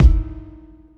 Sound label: step; brainrot; eco cavalo